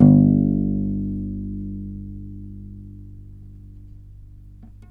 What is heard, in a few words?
hummer, Bass